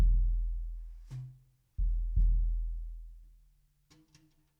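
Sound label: bass drum out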